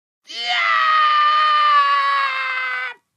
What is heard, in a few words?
Male Fall Scream